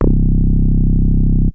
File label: BASS strak bass; Polyend; Legowelt